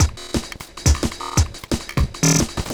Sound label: Glitched Beat 175BPM; glitch kit